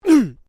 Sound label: Male Vocalization Hun; Male vocal sounds; Exclamations; Voice